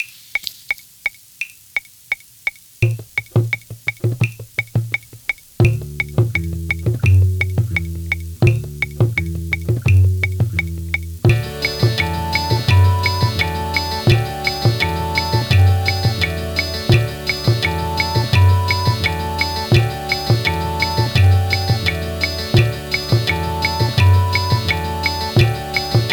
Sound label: lofi folk